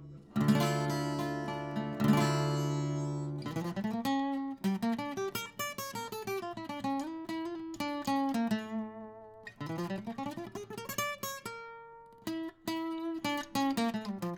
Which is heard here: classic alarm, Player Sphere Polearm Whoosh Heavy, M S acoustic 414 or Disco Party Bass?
M S acoustic 414